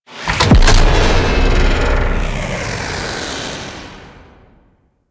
Thumper Bot Emp Impact; Warframe; Grineer

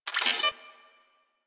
Cipher Use; Warframe

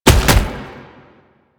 Gallop; Enemies; Steps; Proto Infested Brachiolyst; Warframe

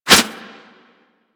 Melee Swipe; Warframe; Kubrow

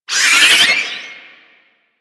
Hand Bomber Proj Warning; Warframe; Man In The Wall

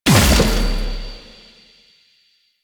Fusion Pillar Pulse; Warframe; Qorvex

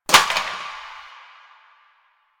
Plastic Drop; Warframe; NineteenNinetyNine

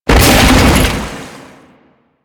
Bodyfall Water

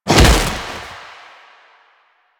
Finisher Throw Impact; Finishers; Enemies; Warframe; Kuva Lich

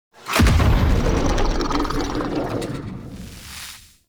Thorn Bow Equip Blast; Weapons; Player; Bows; Fey Thorn Bow

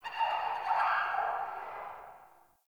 Day Distant Creature; Ambience; One Offs; Glades Of Andurin

